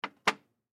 Glass Object Set, Glass Object Set Down Glass Shelf; Set Downs; Foley; SoundEffects-Blastwave